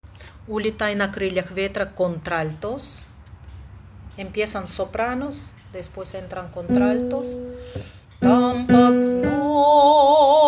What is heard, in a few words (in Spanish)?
Contraaltos